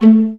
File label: VA PIZZ A2; Best Service; Peter Siedlaczek's Advanced Orchestra